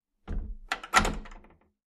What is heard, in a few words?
stall door close, Bathroom stall door is opened and c